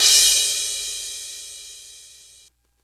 huge drum crash sample e key, Royality free crash cymbal sound sample tuned to the E note.; one shot